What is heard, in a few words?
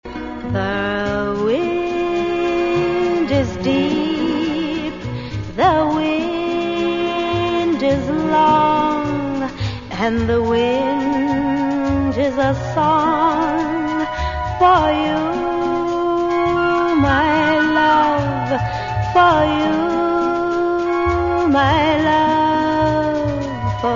Wind Song